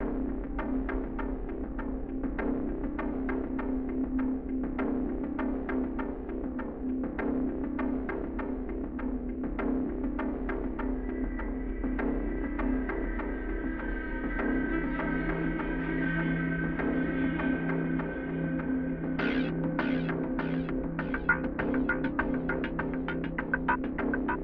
SYNTH

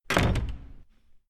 options close

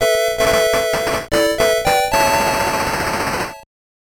Unused Fanfare; putt putter miniature golf; game gear

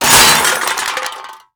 Junk Crash

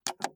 push Button

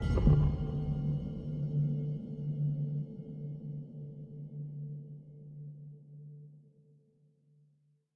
Jingles

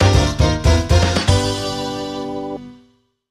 Break Point; Super Monkey Ball 2; Monkey Billiards 2

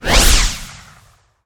Throw Banshee

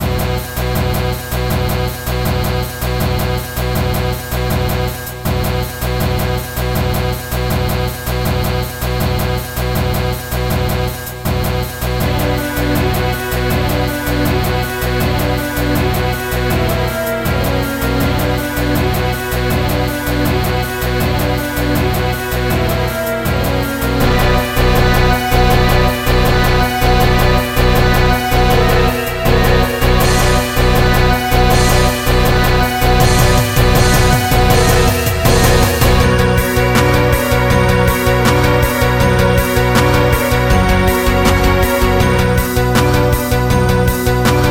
Slow; Sonic2; sonic epoch

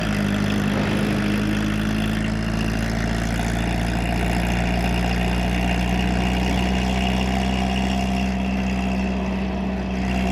Car Loop

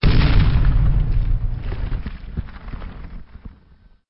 Explosion; CastleMiner Z; Xbox 360

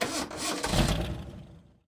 Vintage Sportscar Ignition; android; Does Not Commute

